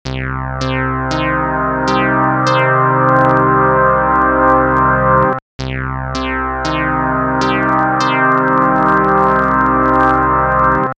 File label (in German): Stereo Oszis